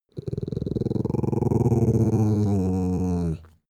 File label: dog small growl wavy